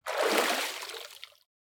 heavy splash; soundscrate

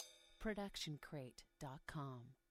ride cymbal 20 inch bell; soundscrate

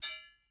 sword hit; soundscrate

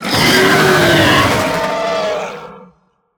monster roar short; soundscrate

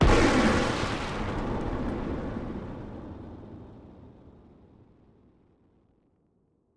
Big Gun; SoundsCrate

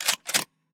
shotgun cocking

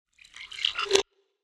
water swell; soundscrate

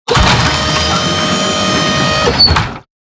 Robotic Movement; SoundsCrate

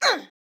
woman attack; soundscrate

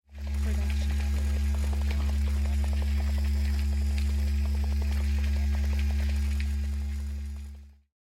lightsword humming; soundscrate

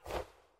cloth pull; soundscrate

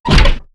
Robotic Footstep; SoundsCrate

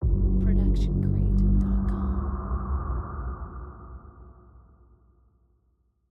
bassy shockwave

